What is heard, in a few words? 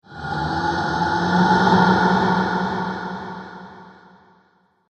Ghostly Halloween Monster; Ghost Sound Effects